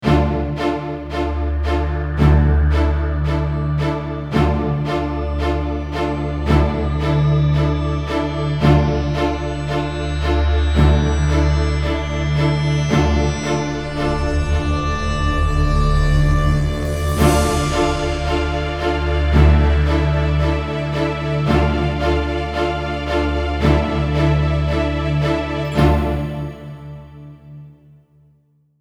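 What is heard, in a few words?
Suspense; Sony Ericsson; PlusFourSix; Tyra (G900)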